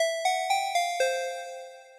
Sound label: overlay unlock; DiscordCanary